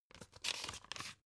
old pick page

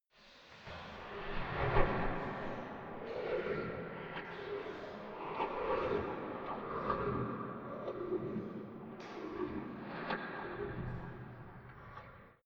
small impacts

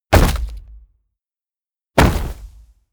sand bag drop

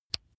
razor attachment remove

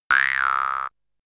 boing classic long

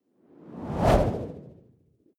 whoosh impact soft wobble low

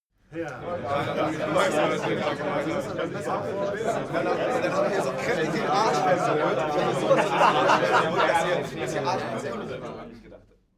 male crowd happy short

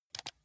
console controller option press fast

